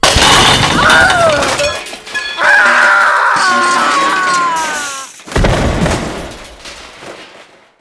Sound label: body; Mafie 1